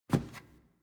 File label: Footstep Wood Stairs